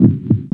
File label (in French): coeur batt; East West - Global Sounds; SC-55 FXS